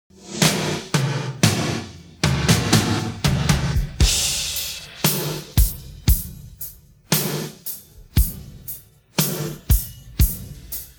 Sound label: drums pattern